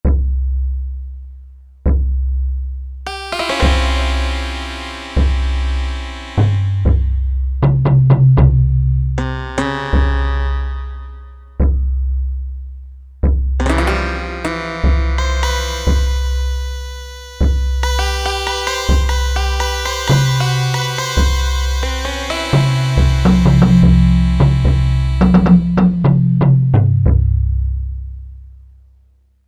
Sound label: Ethnic, Korg MSC-1S Variety Combinations